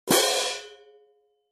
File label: 18in Symphonic German Tone Pair, cymbal sound